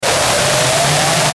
Ascend Bright Static Hiss; Ascending; Sound Design

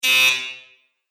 Apartment Buzzer Short Reverb, doorbell; Doorbell Sound Effects

